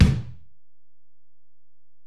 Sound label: Ac BD, Alesis DM-PRO; 300 Drum Machines